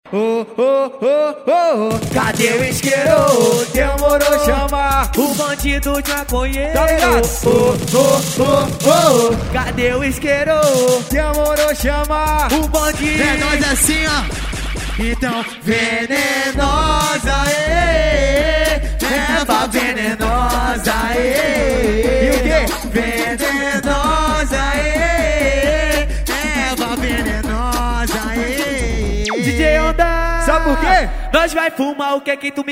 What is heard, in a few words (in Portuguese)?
funk ao vivo